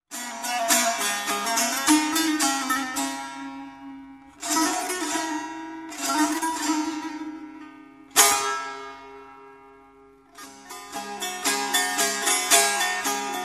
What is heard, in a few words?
Tanbour Solo